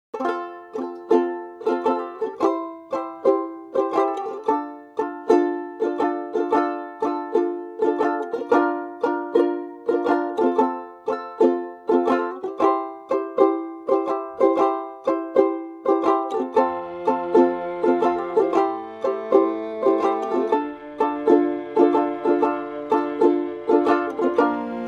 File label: Uke